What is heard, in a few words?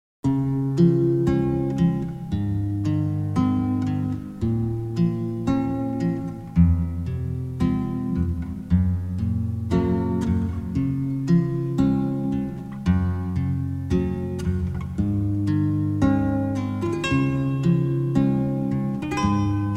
Pachelbel Canon In D